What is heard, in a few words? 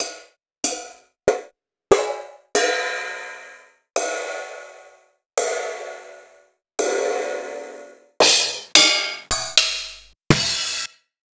sliced cymbals